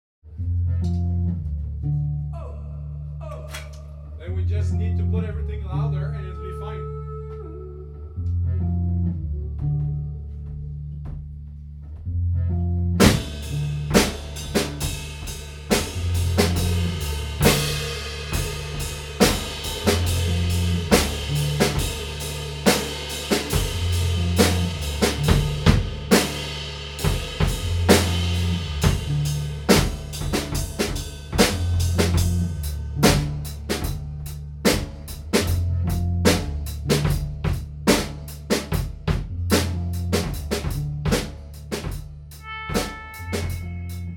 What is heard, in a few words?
Drum Mongo